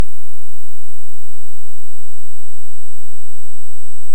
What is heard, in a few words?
Hydrophone; OneOcean